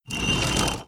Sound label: sliding; Magic Of The Ring; Wazdan